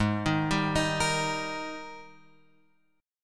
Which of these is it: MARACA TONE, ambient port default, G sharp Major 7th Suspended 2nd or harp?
G sharp Major 7th Suspended 2nd